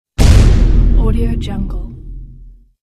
explosion fast

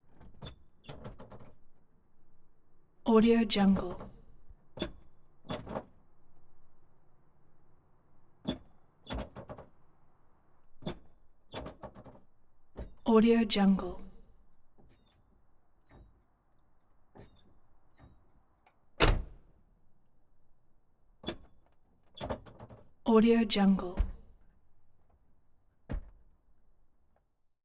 pedal action

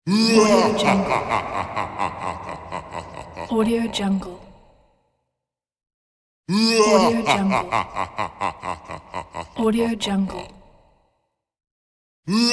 evil laughter

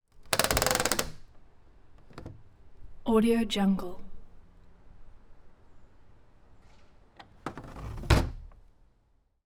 train window

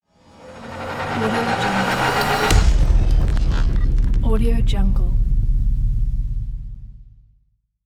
deep whoosh; moonaudioproduction

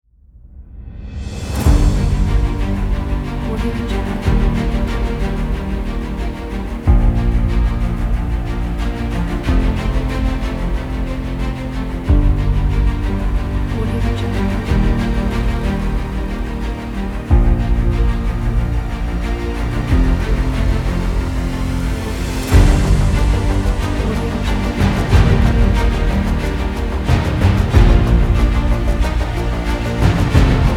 battle

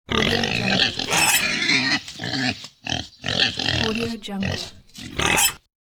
pig

